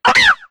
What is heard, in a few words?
rufflet; cries